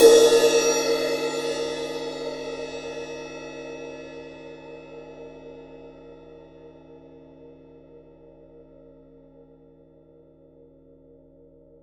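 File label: Cymbal Ride 51cm; Acoustic and Electric; Polyend; Simulacrum Sounds; 1 Shots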